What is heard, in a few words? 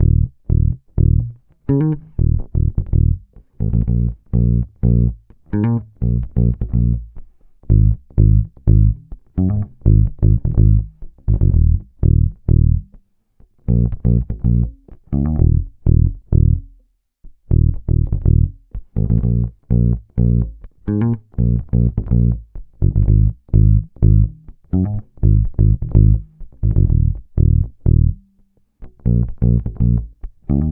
Bass loop 125 Ebm, Electric Bass; Electric Bass Loops; Slate Digital BASSHOLE Sample Pack